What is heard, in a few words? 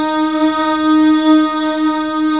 MMM D#4, POP CH